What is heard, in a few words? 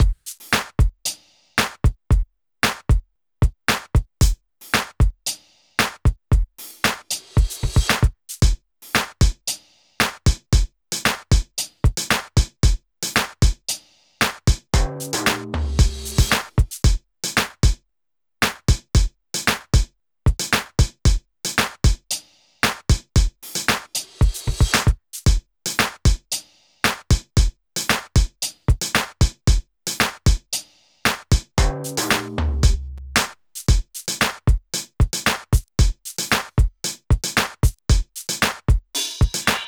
beat